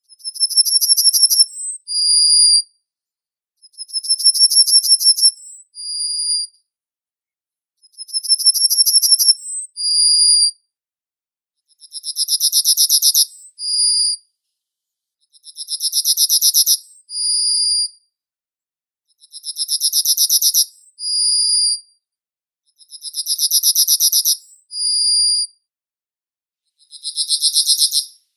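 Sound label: yellowhammer, Emberiza citrinella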